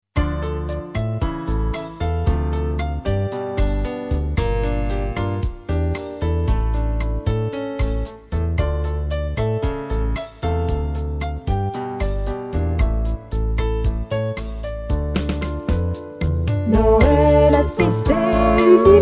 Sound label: Bossa